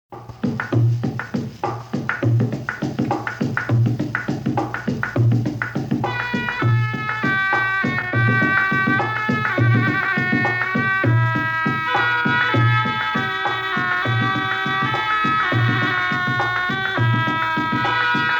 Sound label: Oboe Round